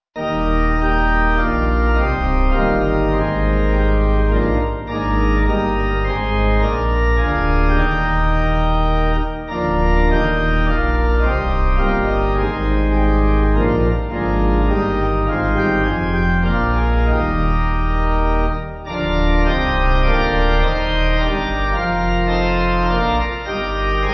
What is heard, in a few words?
pipe; For All the Faithful; Kuortane